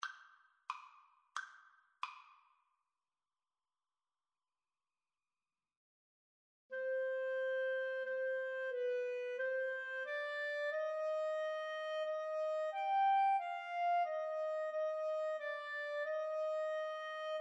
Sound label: pavane, Clarinet Duet